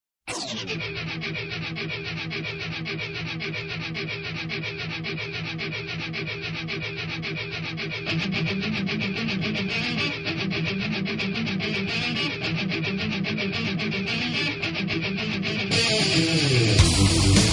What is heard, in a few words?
heavy guitar